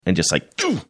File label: tchooo